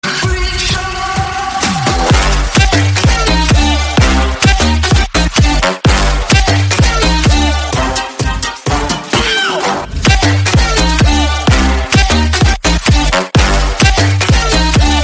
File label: klingelton ringtone